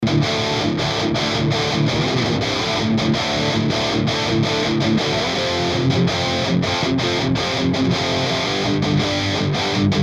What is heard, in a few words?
powerball double